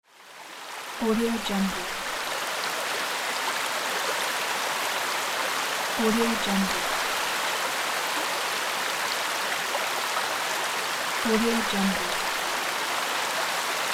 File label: river